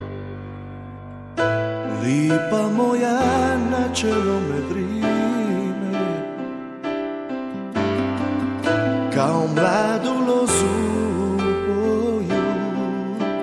romantic croatian

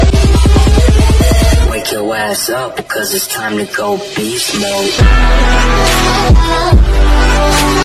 bgm ringtone

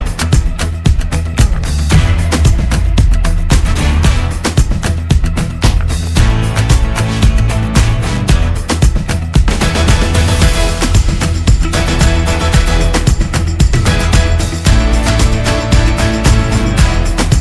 hollywood ringtone

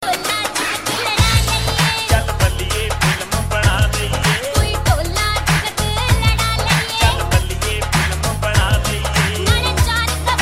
punjabi ringtone

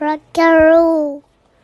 notification rock and roll; ringtone

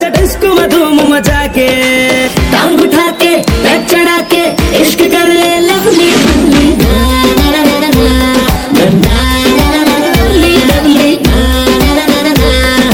pakistani, ringtone

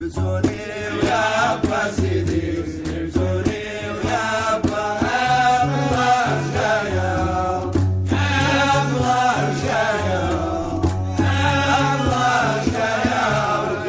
nice old slow ringtone

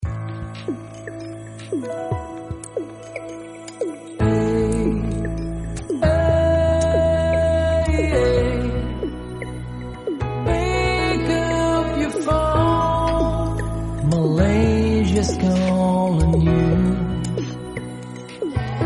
inspirational ringtone; malaysia

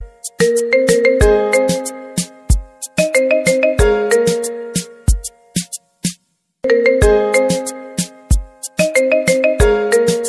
bollywood marimba

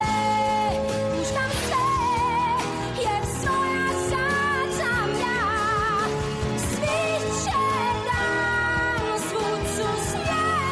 crotia ringtone

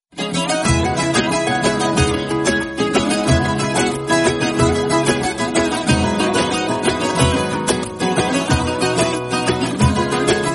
turkish music

